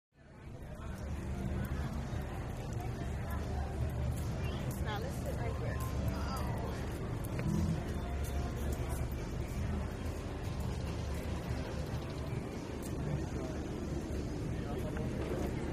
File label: Boat Race Walla Amb, Off Shore Power Boat